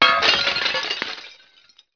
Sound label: glass breaks; PC Gamer IT CD 41; Daikatana